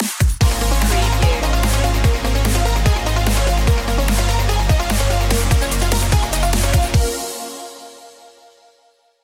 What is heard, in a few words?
biodigital future stinger